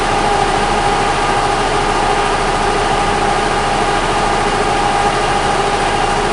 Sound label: PRESS, INDUSTRY; 1000fx